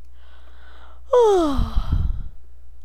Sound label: yawn